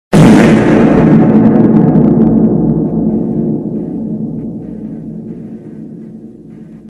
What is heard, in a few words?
fart loud